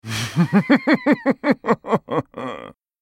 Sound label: laugh; Talon; en US